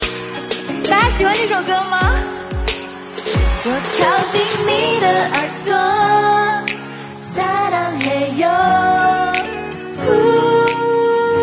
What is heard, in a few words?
singing